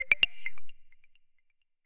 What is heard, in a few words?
button; igloria2